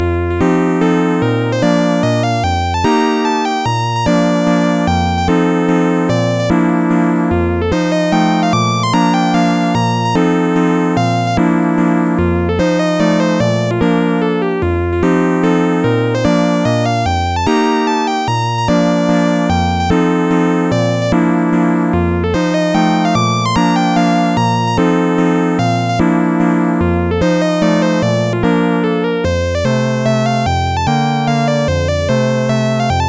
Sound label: mazurka; Sonix Music Driver